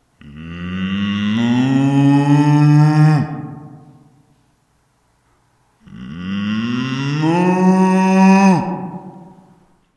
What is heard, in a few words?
mooooo; klingel